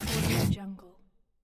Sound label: game mechanical drag sound